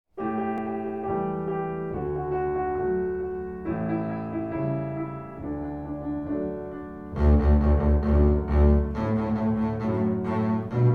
Twinkle Twinkle Little Star Variations version for double bas